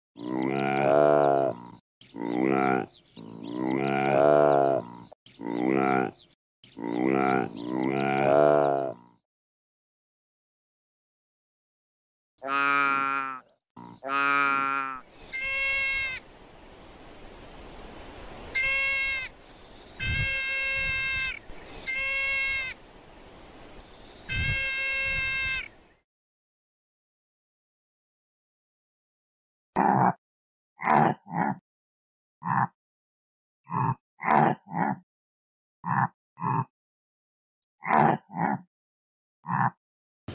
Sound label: Gazelle Sound